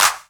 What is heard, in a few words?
Clap n Snap